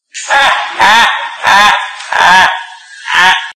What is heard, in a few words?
asian person laughing